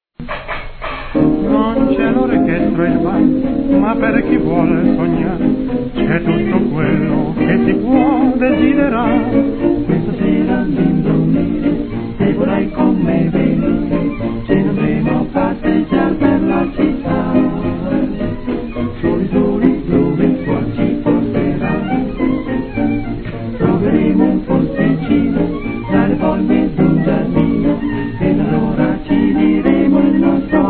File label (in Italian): TRIO VOCALE & TENORE